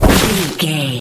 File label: nature spell bush tree whip